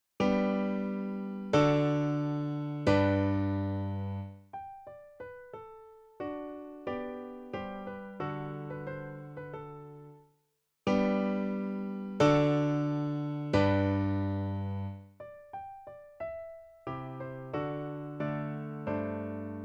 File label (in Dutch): harmonisch